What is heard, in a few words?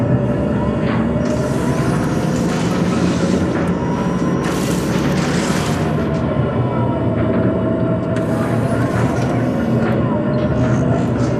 Robot Arm Track Fast Mid; Boomtown; Titanfall 2